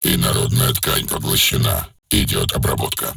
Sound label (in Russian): ИЗМЕНЕНИЕ ТЕМЫ WINDOWS; Crysis2